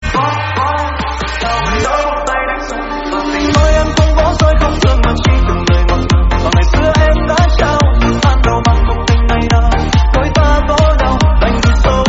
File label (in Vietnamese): Nhạc Chuông, Remix